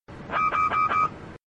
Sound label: 757 Cockpit Alarm